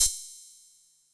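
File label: Vermona DRM2 hi hat open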